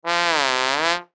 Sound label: Trombone Swell, Trombone Swell Fluctuate Funny; Cartoon; Musical; Blastwave